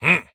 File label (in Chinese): 卫道士待机声